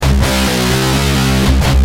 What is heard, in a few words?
G Heavy, Guitar; Industrial